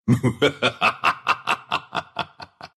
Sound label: laugh, ru; Dota 2; Lycan